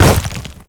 land, footsteps player debris

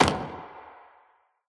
grab suitcase; scripted sequences; ending; gman

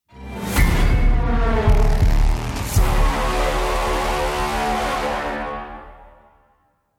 deathcam

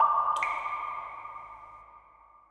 ambient water drips; Portal 2

